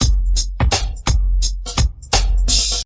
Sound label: plate 85, drums